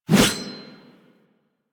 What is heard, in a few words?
Sword Swipe, Melee Whooshes; Warframe; Weapons